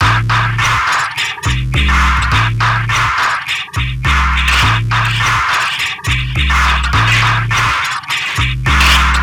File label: Metals Loop; Sonic Foundry (Sony Creative Software); Esoterik Beatz